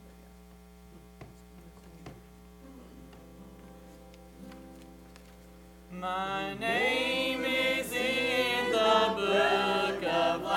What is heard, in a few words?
singing Family